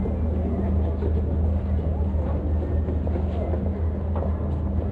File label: ambi in; Mafie 1